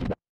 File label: retro game text blip